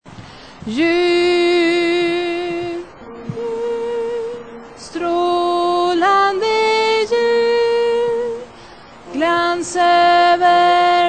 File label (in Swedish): bas